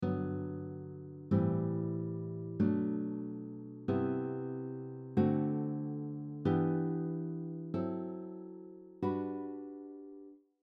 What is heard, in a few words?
G Diatonic 7th chords, guitar; diatonic chords example in G Major